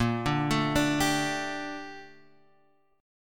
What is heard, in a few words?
Bb Major 13th Bb down Guitar Drop D